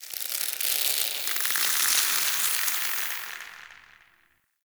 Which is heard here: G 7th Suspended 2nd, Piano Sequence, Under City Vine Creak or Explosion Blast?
Under City Vine Creak